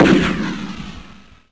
rocket; ptanks

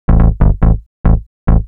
SNTH BASS TEKNO 140 A, Techno, Bass

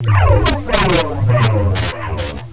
prox; X-men; weapons

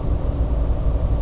space; aggress

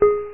tone; Oids 1.3

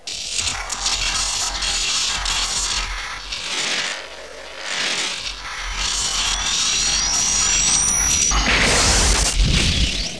x boom

